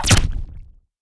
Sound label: rail hit; weapons; bullets